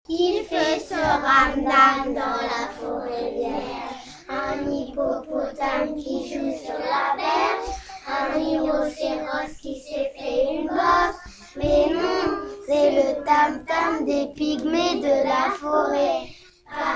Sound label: tam tam